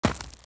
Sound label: foot dirt, footsteps; midnight guns